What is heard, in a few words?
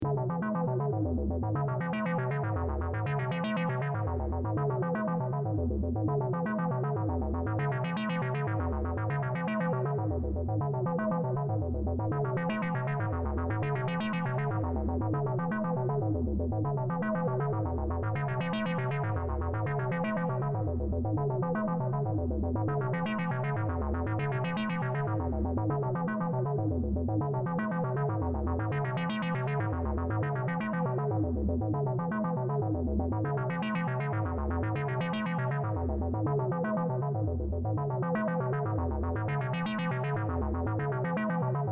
synth sample, stereo panner; analog synth